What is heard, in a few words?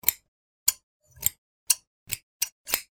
scissors